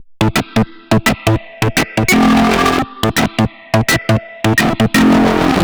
Organ A, 85bpm, analogue circuit; musicradar

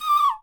bird call

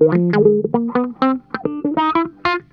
NOTE, Funk Guitar; Zero G - Funk Guitar

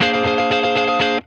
A# JANGLE, Funk Guitar; Zero G - Funk Guitar

